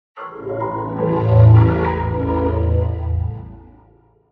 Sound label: Windows XP Startup Sound in Etcho Voco Pad, Vocoder